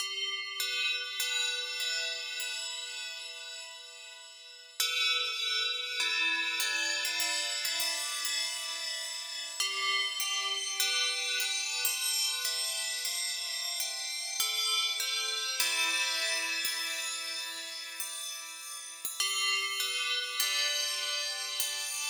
bell 100bpm C

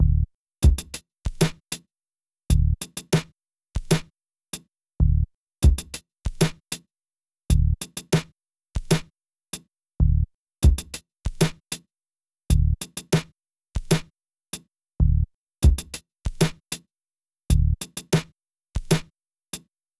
drums 96bpm

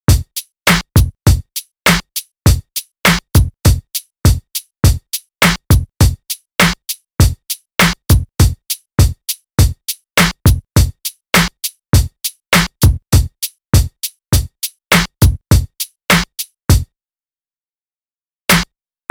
macfunk

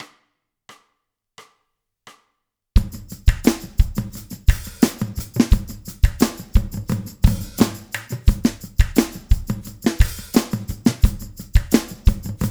Funky Rave

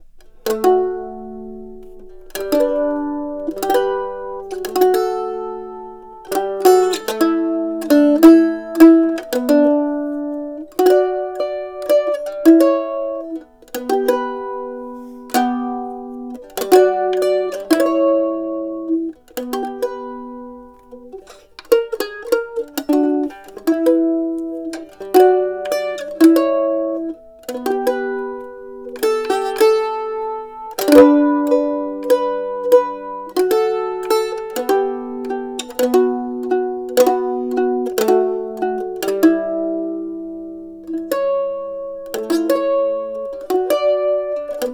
Mando dry; MandoMilleRegretz

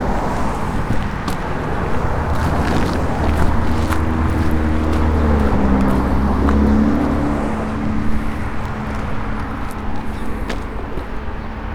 outside ambiance; Coruscate

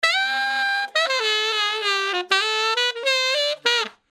brass loop

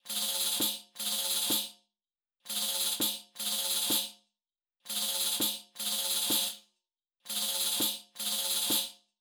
Snare

